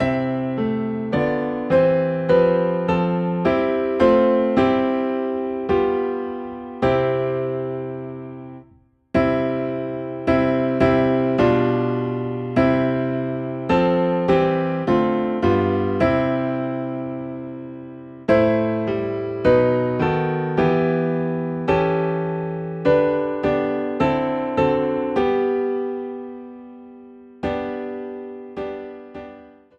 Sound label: Piano in C